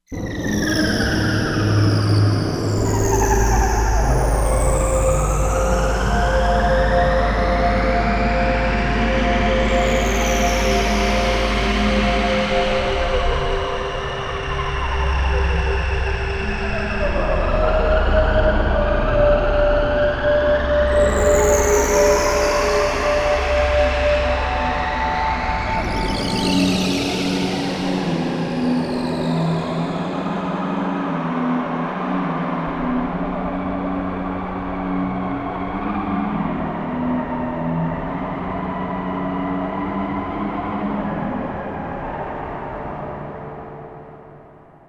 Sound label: SFX, Korg MSC-16S; Environment